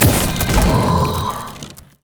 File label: item sightward tree; LoL